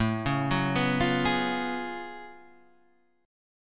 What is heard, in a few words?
F sharp Minor Major 11th A